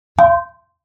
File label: Frying Pan Hit - Hard; Hollywood Edge; sound designer's toolkit v2